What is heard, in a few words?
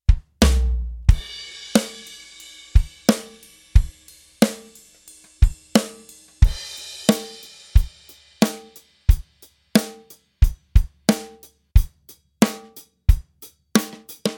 acoustic